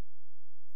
A#, FX; Big Fish Audio

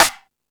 Percussion FX, E-MU Pro-Cussion

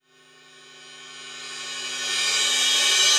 Reverse Cymbals

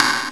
WINDER, percussive; Rarefaction; Poke in The Ear With A Sharp Stick

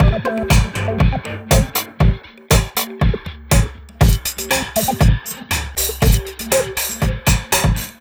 Jump Keys, Beats; Sonic Foundry (Sony Creative Software); Synchro Funk

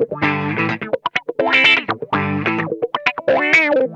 ROCKIN, Funk Guitar; 90 s Sample CDs; Zero G

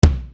Bass Dr, EuroDance Bdrums

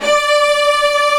STR SEC D4; AKAI S-Series CD-ROM Sound Library VOL-1

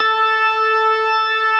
STOPS, Synth and Organ; InVision Interactive; Keith Emerson; The Most Dangerous Synth and Organ; ALL STOPS

